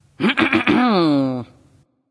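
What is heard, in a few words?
clear throat, female; smite; voicepack; hera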